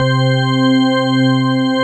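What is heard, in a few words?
full organ C3; FULLORGN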